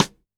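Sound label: Snare Stick, drum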